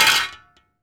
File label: IRONWARE, IRONWARE HIT; AKAI S-Series CD-ROM Sound Library VOL-6